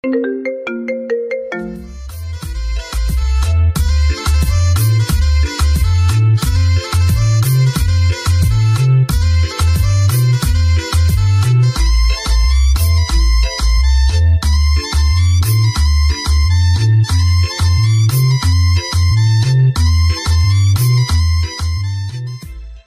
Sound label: Marimba Remix